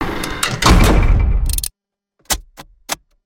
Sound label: vault lock